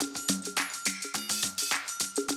Classic DrumLoops

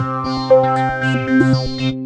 T WAVE, Synth Waves, Techno Trance

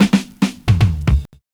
FILLS, 70_s Breakbeats

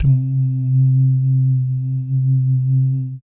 BS DUMM, Vocal Planet Jazz & FX; ILIO; BASS DUMMS

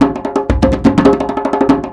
Percussion Loops, Bongo & Conga; Houseworx

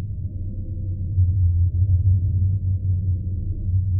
Sample n Hold Verb Loops, sparse soundscape

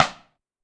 Rim, Drum Machines DDrum-AT Pitched Down; 300 Drum Machines; Clavia

